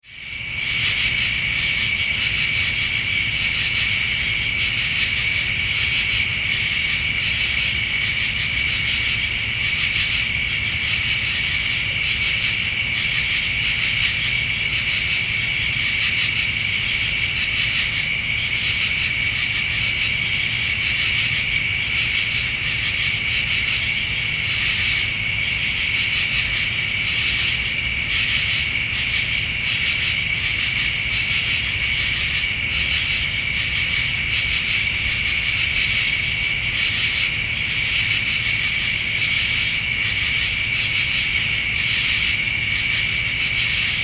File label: crickets